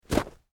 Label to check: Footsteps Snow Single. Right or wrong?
Right